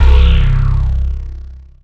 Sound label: Pickup Scifi Energy; SFX Kit